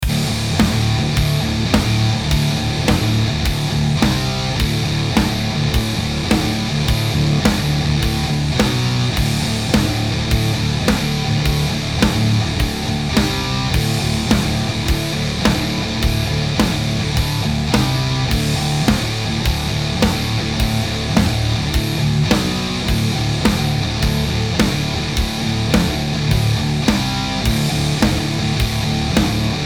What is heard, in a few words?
soundcheck, r212, e906; sh6; rp15